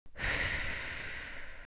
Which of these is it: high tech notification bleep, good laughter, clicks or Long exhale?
Long exhale